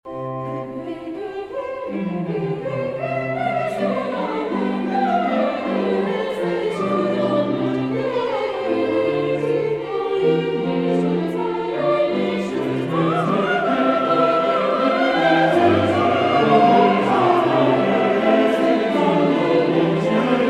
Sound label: English Sample